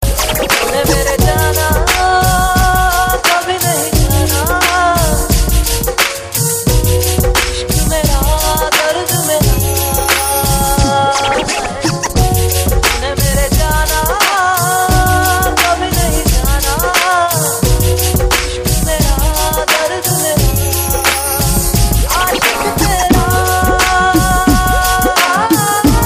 Sad Song

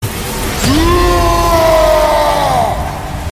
Scream; Kratos

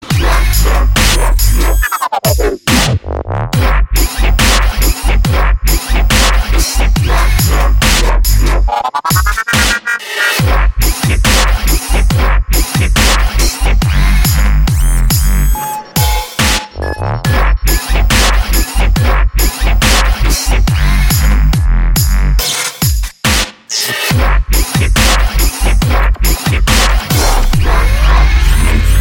Dubstep

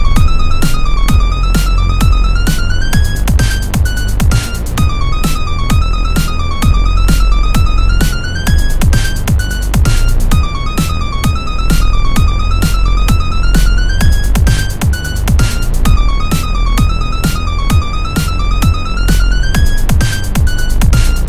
Fruity Beat

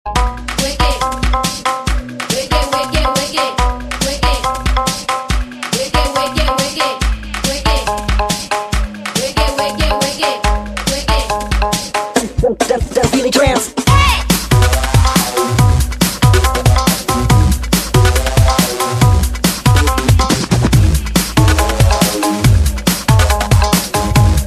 Breakbeat

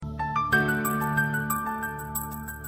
Cool Msg Tone

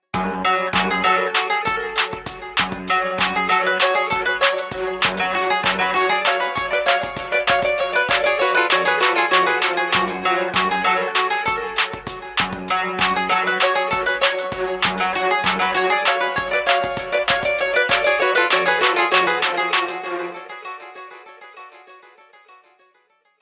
Flamenco

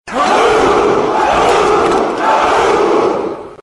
Ahuh

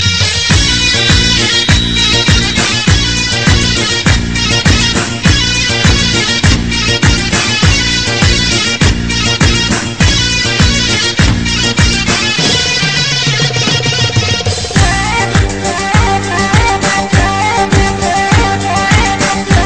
Iraqi Debke

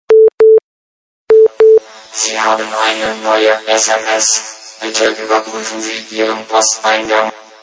Robot Sms

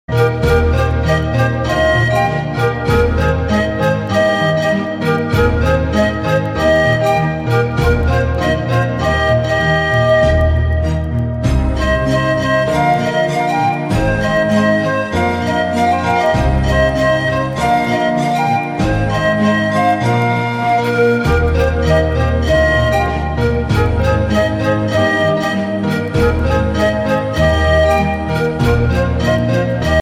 Lovely Flute

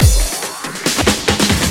Loops Fill in 140; Vec1

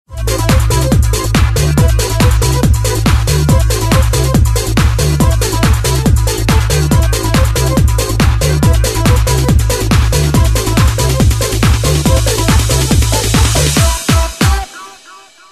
Resonance

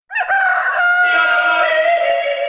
Rooster Sms

